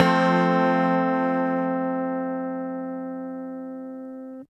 Ac 12 Str Gtr F free, Twelve String Guitar, African Guitars; Quazibeat; African Secret